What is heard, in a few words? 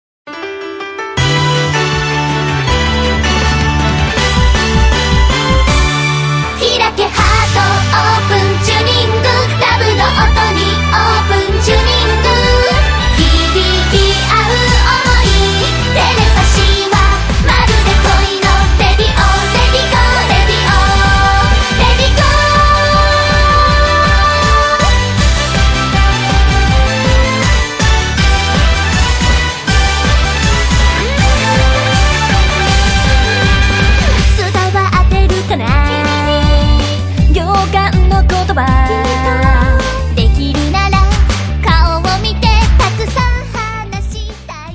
Open Tuning